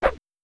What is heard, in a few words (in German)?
schlagwind; mount blade